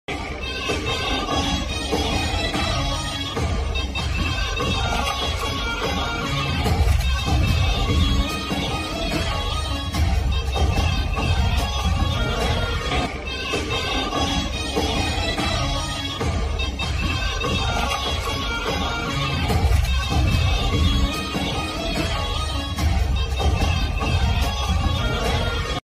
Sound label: villain bgm; menon